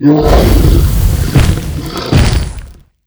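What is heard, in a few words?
hyperion death, monster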